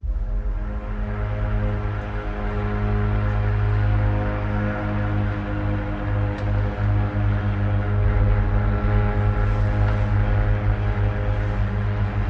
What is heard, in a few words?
Hush Little Baby Horror, No Melody